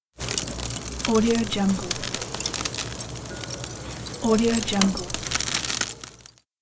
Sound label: barbecue cook sound